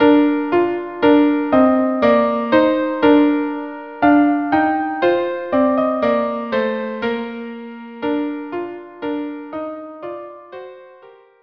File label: violin duo; Maoz Tzur